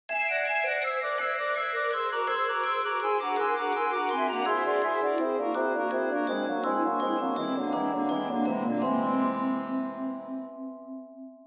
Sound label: Transition; Castle of Illusion Starring Mickey Mouse